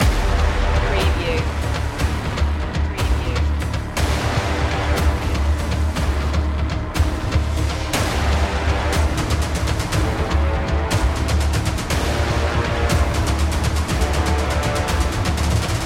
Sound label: attack force loop